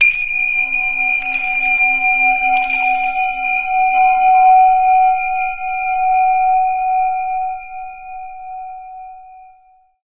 zen tingsha; Source Engine; tf; japan fundraiser

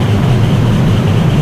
turbine, ambient; Source Engine; tf

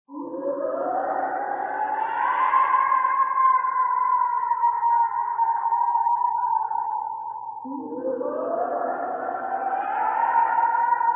banshee, eerie banshee screams and wails; cartoon

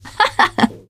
positive noise, teen girl, survivor voice; Left 4 Dead; Source Engine

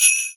xmas sleigh bells

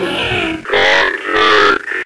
zombine alert; npc; source engine; episodic

